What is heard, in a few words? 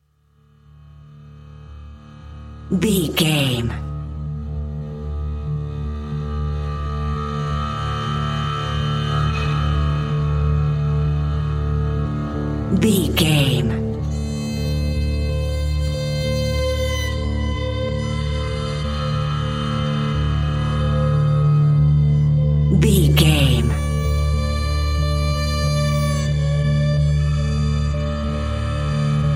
whales space